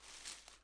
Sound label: leaf; gmod